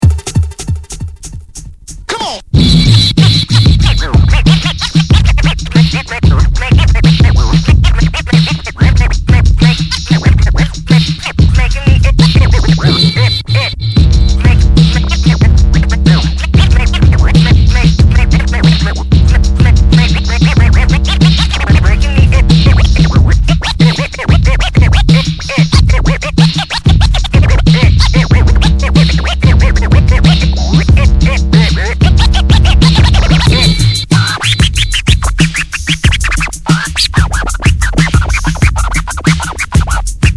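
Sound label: scratch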